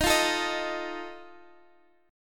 D sharp Suspended 2nd Flat 5th D sharp, Guitar Open D; x,x,13,11,12,13; chordshape